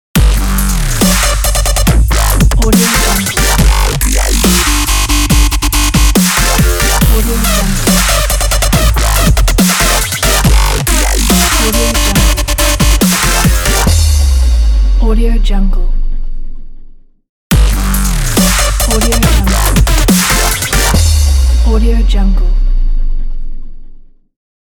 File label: dubstep logo